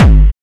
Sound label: hard bass drumz